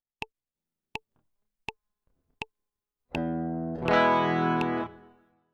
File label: intro, guitar